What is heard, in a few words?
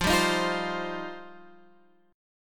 G 7th Suspended 4th Sharp 5th F